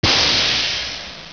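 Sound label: FLAMTHRO flamethrower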